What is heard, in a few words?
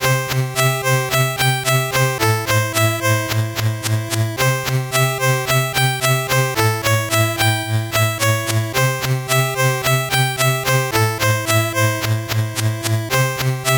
8bit bgm normal